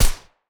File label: beretta silencer; STALZONE